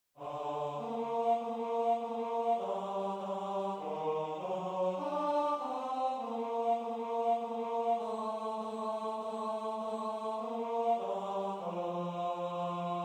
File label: tenor